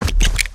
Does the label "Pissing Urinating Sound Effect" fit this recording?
No